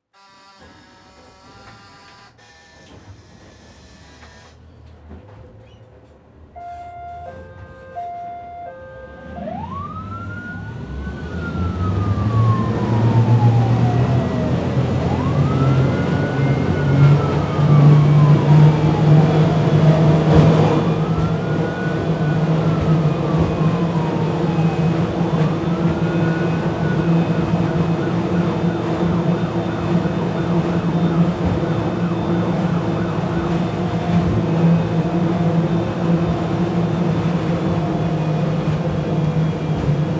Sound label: Transportation Patrol Boat Drive On Open Water Interior Sirens On